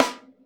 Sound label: RAW SD, Drums Acoustic; USB Soundscan vol.10; AKAI; 90s sample CD; ALL SD